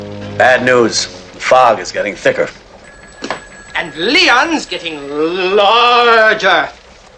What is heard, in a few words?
airplane